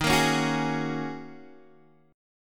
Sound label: D sharp Minor 11th D sharp Guitar Open D, chord